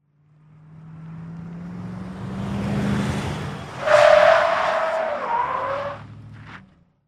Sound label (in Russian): podezzhaet i driftuet pered nami